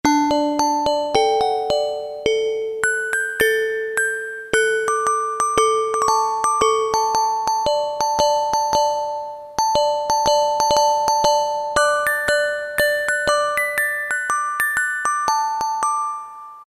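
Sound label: Glockenspiel, Roland MT-32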